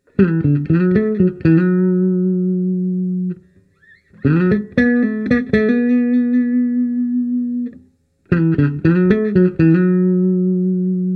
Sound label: AE 212 RAW LEAD; berg ae112